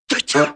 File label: Yeti attack, game; Eft